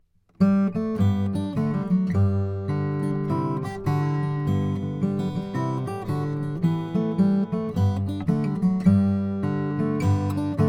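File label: froggy bottom h12 german spruce top, guitar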